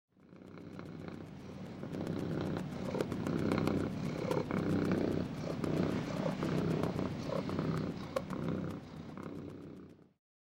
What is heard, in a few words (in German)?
Schnurren